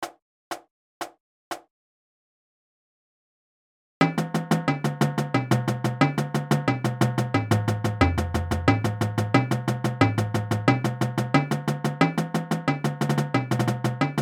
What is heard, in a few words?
Rolls, Drumline Warmups